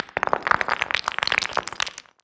rubble

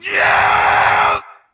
vce dfa jump

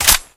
button; Escape from Tarkov

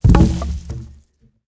pole falling; World of Tanks Blitz

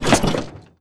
snowmobile suspension light

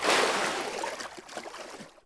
Wtr Oars Up; Civ4 warlords

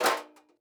ui drop metal sheet; Rust

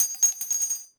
casing bounce hard

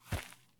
run grass low; Escape from Tarkov

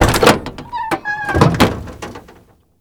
airport ambulance door open; Modern Warfare

